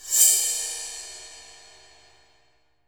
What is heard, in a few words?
BR CYM SCRAPE, drums; KITS-LARGE